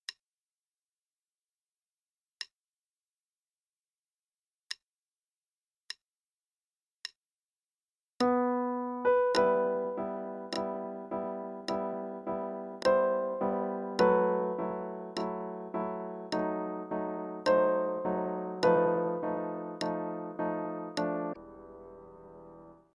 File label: Prelude, with click qn=52; Chopin; Op28No4